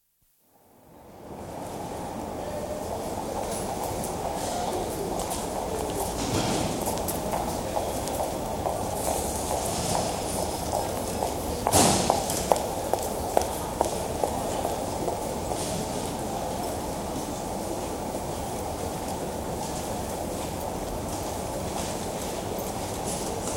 Busy Mainline Station, atmosphere before arrival of train; BBC